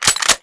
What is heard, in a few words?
w73 pump, winchester73